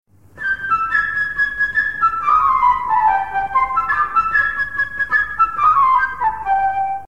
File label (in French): oiseau flute